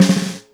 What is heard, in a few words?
Snare Loose